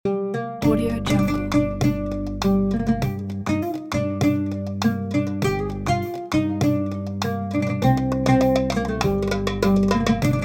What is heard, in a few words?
oud ney and arabic percussion folk; prosoundlab